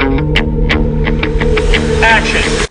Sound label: incorrect